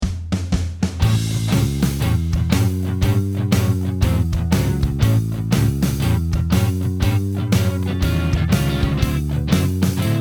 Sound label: Blues Backing Track, guitar